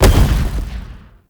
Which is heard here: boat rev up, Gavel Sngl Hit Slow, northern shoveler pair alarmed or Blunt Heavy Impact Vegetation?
Blunt Heavy Impact Vegetation